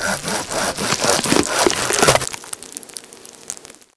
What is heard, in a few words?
doctor head saw